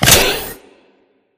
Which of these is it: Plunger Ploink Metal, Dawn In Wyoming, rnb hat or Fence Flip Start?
Fence Flip Start